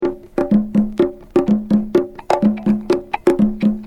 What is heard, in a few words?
drum loop